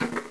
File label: plastic